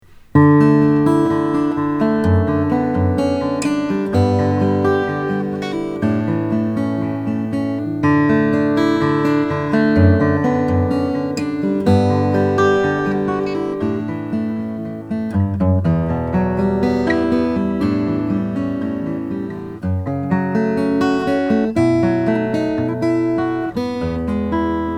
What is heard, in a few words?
merrill om 18 mahogany; 2008